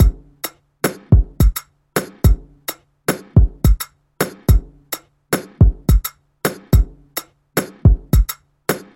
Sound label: Afro Drum Loops 107BPM